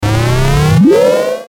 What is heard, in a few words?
Ascend Synth Power Up Buzz; Blastwave